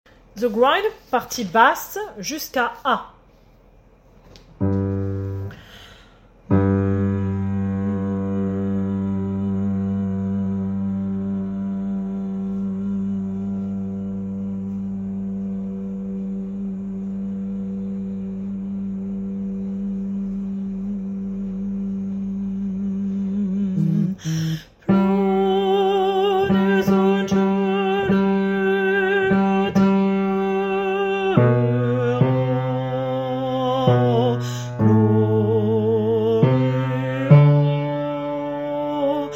basse du haut

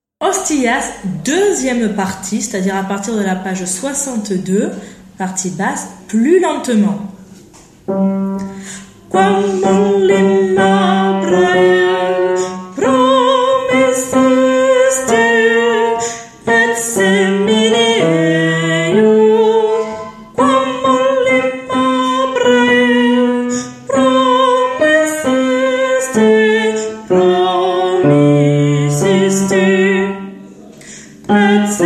Basse lent; Requiem; Hostias; Mozart

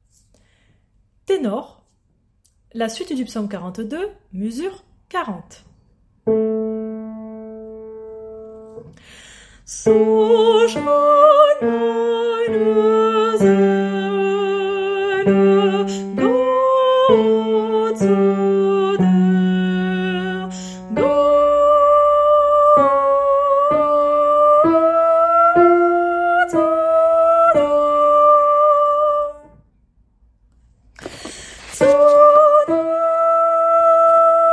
tenor; Suite psaume 42

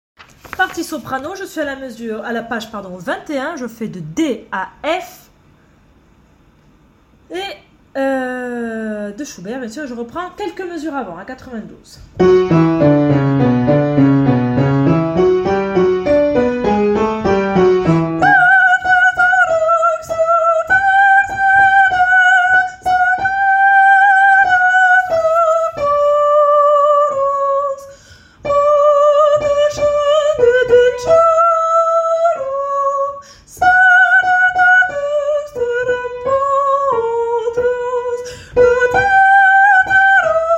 partie soprano; credo

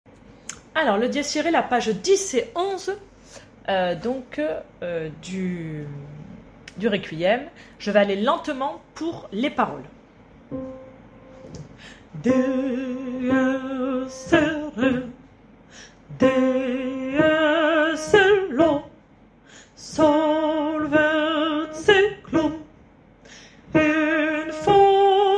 Soprano

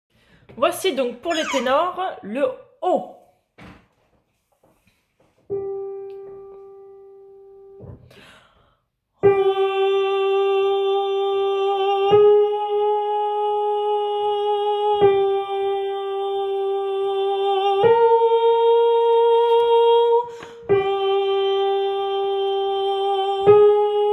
tenor haut; Kyrie OP

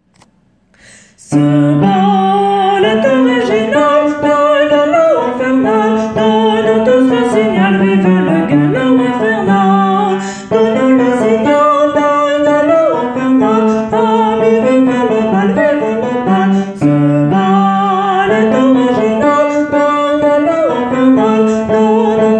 basse

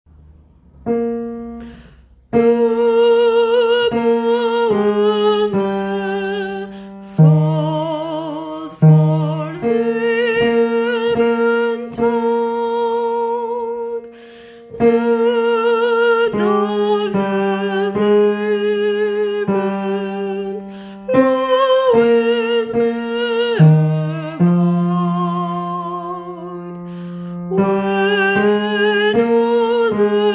partie tenor

